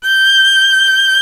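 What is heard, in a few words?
violin G6 left; Greytsounds VOL-1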